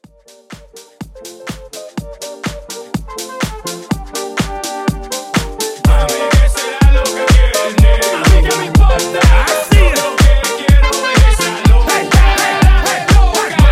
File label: Latin House Mixshow Edit